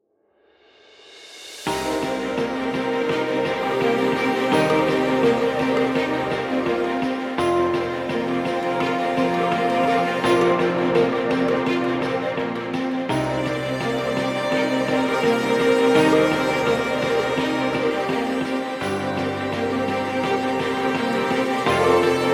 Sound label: Ambient Background Relax Music